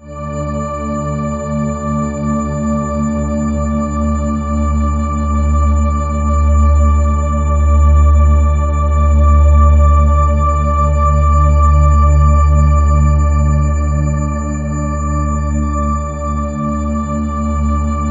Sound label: WAITING, SYNTH SND, Ominous Drones; USB Soundscan vol.48; AKAI